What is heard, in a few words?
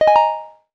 future popup, computer sounds